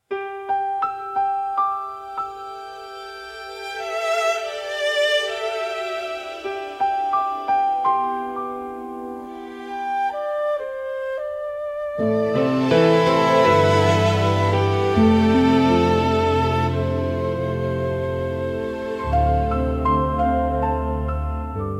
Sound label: fantasy music nature forest